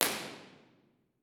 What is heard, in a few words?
Dodec IR, Teatro Municipale Piacenza; Dodec IR B&K2250